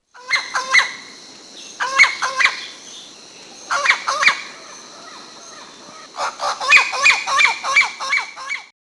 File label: parrot